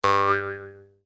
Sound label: cartoon spring bounce twang